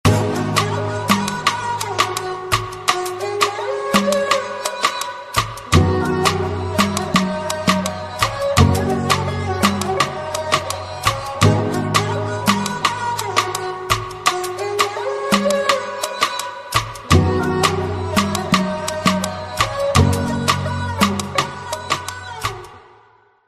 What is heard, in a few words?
slowed ringtone